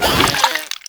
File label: Infested Runner Jump Whoosh C; Enemies; Abilities; Warframe; Proto Infested